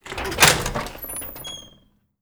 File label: door metal squeaky slow open; Doors; Call of Duty Modern Warfare